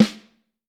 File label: Vintage Snare Drum; Q Up Arts; Johnny.C's Vintage Snare Drum; 5X14